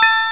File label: chime; Enciclopedia Del Perro